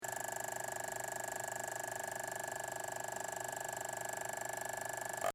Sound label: macbook noise low